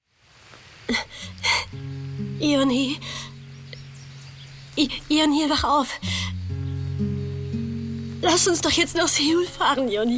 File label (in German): drama verzweifeltes weinen; Bedevilled